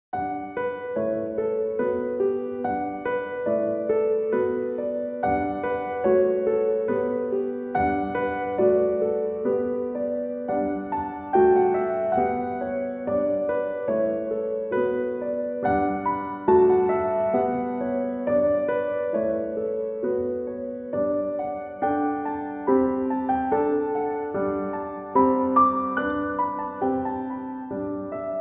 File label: Piano Miniatures - VI. Journeys Reprise